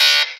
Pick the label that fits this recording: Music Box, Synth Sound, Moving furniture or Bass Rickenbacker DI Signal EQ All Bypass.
Synth Sound